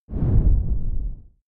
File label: whoosh simple low short grainy